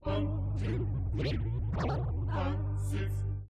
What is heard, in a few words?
phasevoc